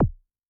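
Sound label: bass drum Dance, Kick, Yamaha DTXpress-II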